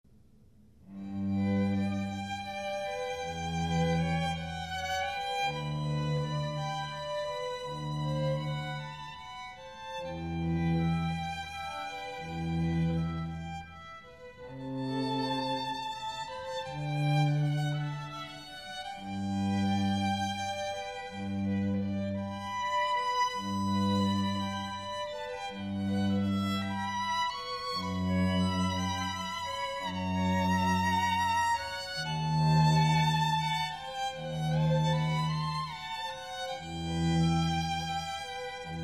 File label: trio; Bach